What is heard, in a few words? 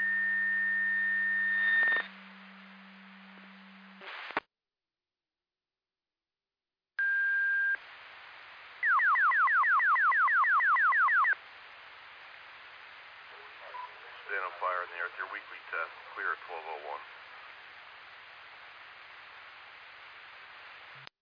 Siren; Hamburg FD